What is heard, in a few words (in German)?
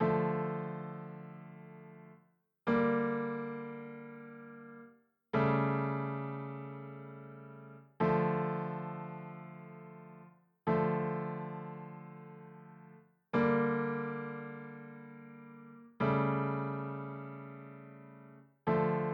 Tango Harmonik; Seiber